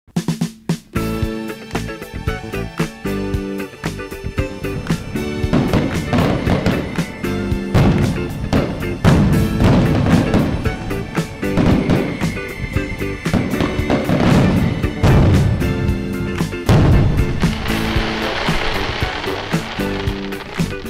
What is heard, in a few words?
Fireworks